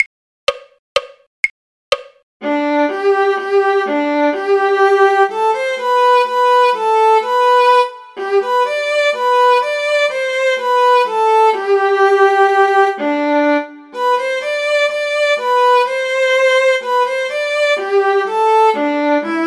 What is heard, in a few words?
viola; Sweet Nightingale; folk